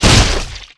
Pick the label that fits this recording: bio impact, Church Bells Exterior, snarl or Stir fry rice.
bio impact